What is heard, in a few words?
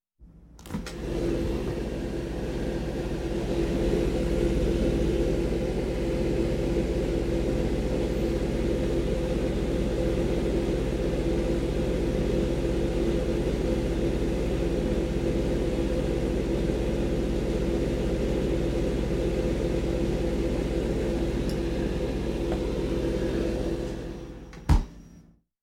Silent industrial machine running